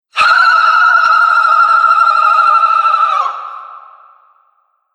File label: Scream Banshee, monster; Monster Sound Effects; horror sound effects